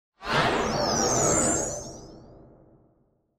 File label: Sci Fi Spaceship; Sci Fi Spaceship Fly By Sputter; Spacecrafts